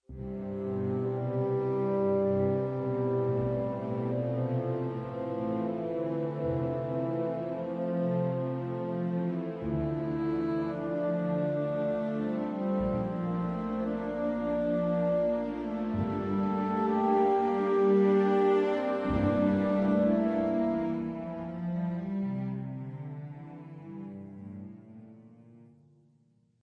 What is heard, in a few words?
melody ww summary bassoons